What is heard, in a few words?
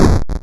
explosion